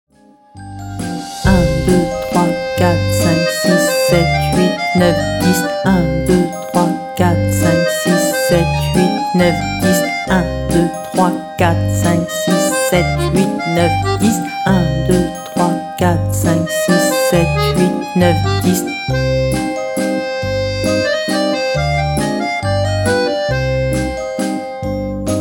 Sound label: Childrens Lullaby